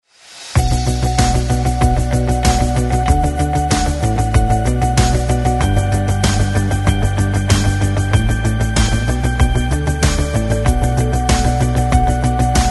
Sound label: rock pop